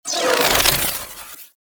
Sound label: Rifle Fire Stop, weapons; Gyre